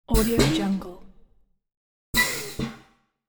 activate mine or set down

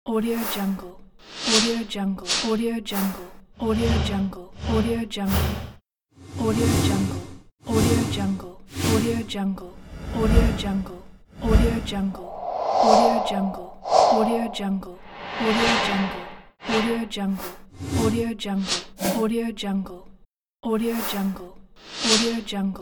whoosh and swoosh sound effect pack

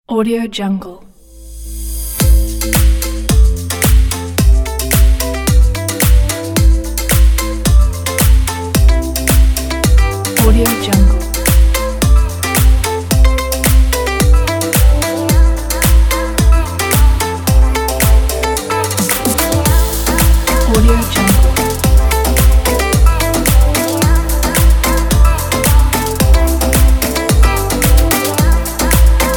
tropical light

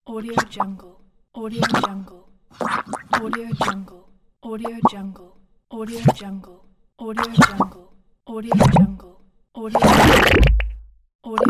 cartoon mud bubbles

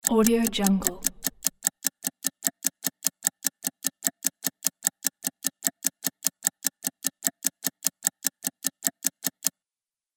clock ticking

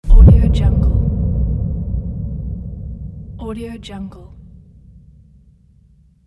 impact; playbeatworx

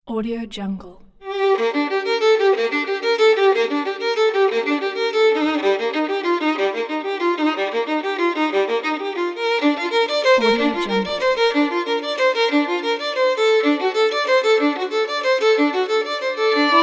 cinematic classic violin

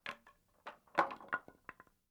board drops single wobble various versions; prosoundfx

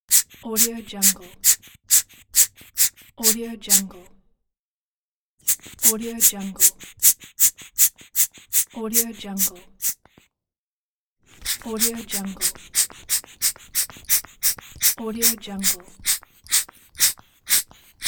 air pump; sounddivision

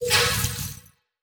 tech expert buy parts; war ui class specific; esmteam

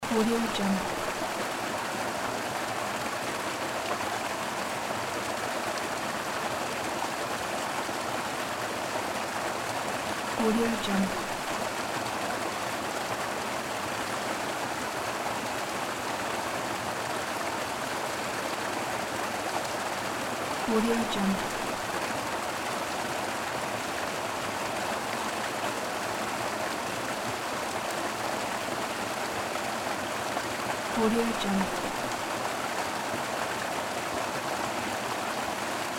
waterfall splashing on rocks

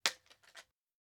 shotgun handle

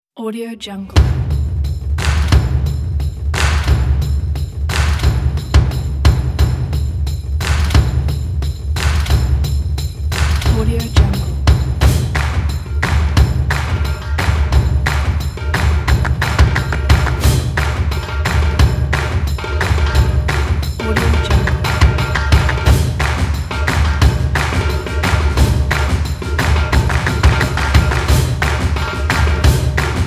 energetic clap percussion

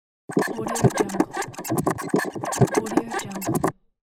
mechanical robot movement

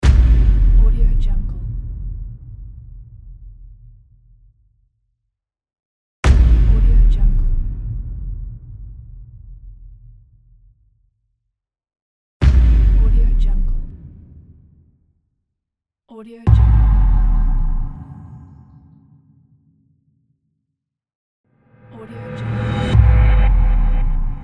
boom sound pack

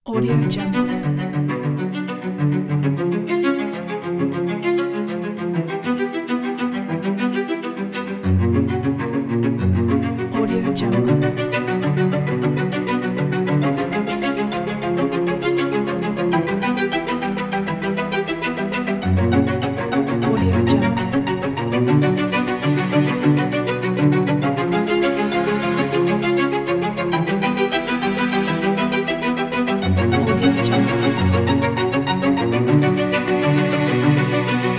the classic violins; garagesound

